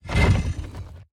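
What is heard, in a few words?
movement armor heavy; smite; god atlas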